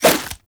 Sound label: nunchuck end whoosh; Warframe; Infested; weapons